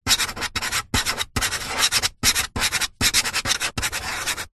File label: office pen writing on metal